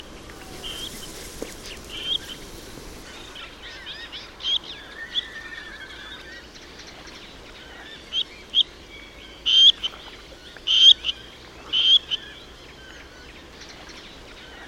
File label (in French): grebe a cou noir; chant